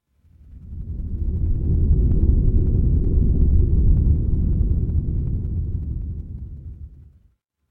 horror wind whisper

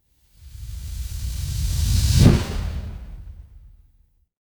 fire electric whoosh flame

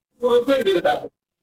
feedback achievement

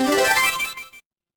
retro game power up

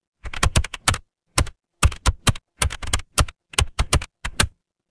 office keyboard

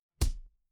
impact punch

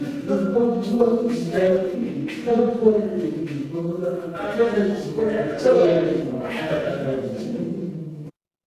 crowd murmur

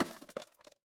impact crash

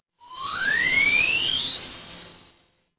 transition sweep

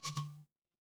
feedback achievement

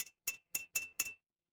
glass glass tap

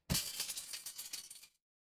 glass shatter

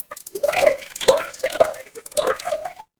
water pour

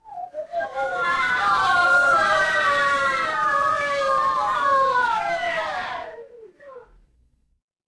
crowd cheer

